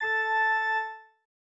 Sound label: organ